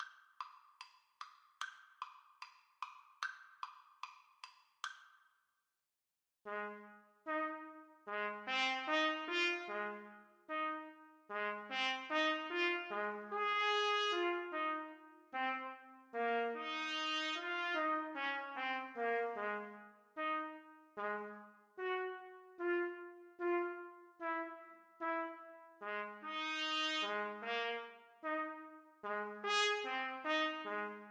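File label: when the saints trumpets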